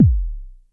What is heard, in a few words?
909 bass, Drums, Roland SH-09